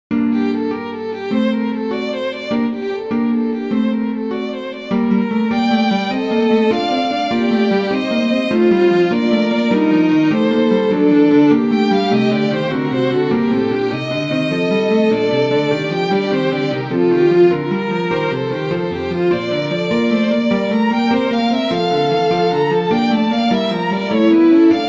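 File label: Handel trio sonata for two violins and bass; g. f.gendel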